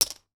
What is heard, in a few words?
chips light